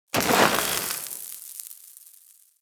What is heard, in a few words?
Spark Shower; Fortuna; Warframe; Venus Open World; Venus Solaris United